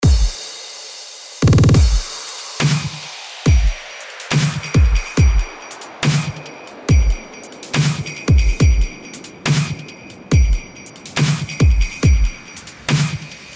Kick Beats; pack noel

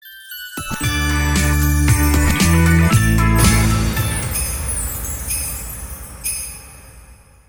Sweeper; jingles noel; carpentras

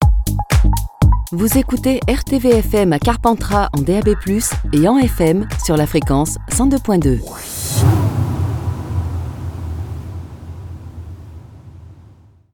Jingle; Carpentras

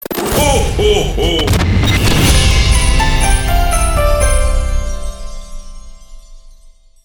Sweeper; jingles noel; carpentras

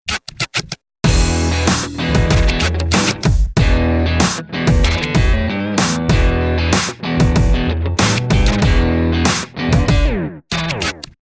Bed Rock

